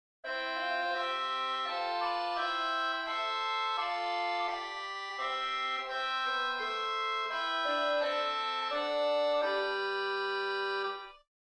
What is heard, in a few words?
Sw RohrSchalmei4, organstops; First Baptist Riverside